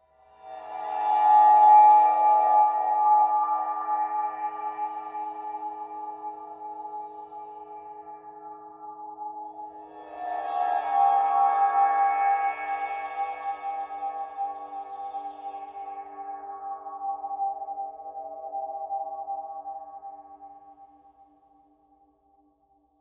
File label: Horror Cymbal Swells Pan; Scary Tone; Blastwave